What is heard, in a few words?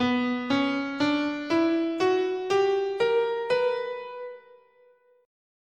B Harmonic Minor, scale